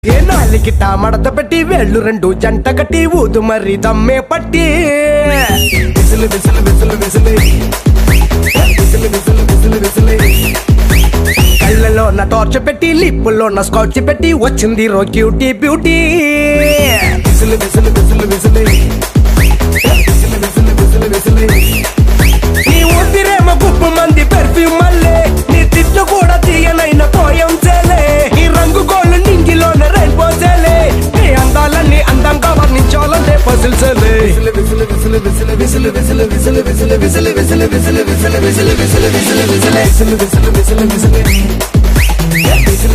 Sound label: Whistle